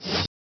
menu cancel

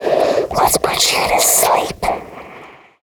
Stalker Infected, zombie; zombie plague

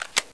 mp7 foley, weapons

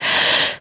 Siren Prepare Scream; zombie plague

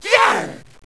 attack

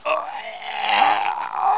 die; cstrike; biohazard

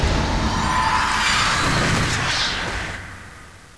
Divine shield

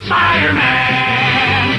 spider; cstrike

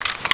clip in

